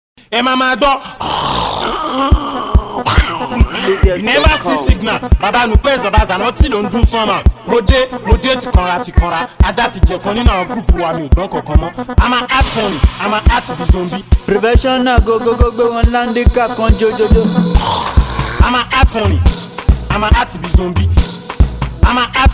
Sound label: Cruise Beat